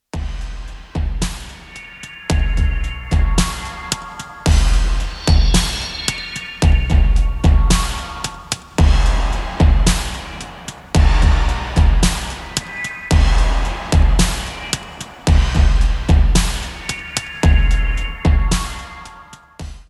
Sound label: Digi Beat, drums